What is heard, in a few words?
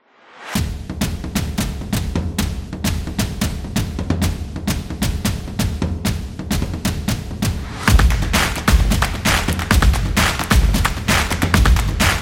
Percussion Intro; high energy